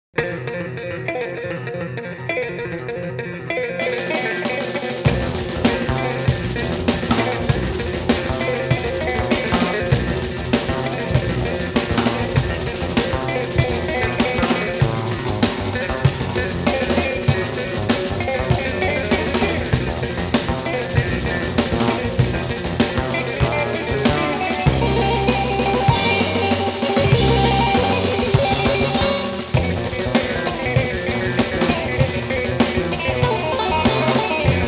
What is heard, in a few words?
Dodecaphonia